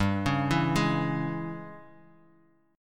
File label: Ab Major 7th Suspended 4th Sharp 5th G, Guitar